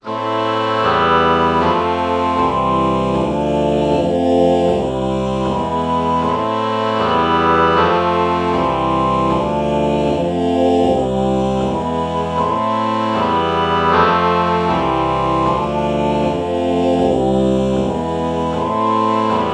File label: oh oboe chord morph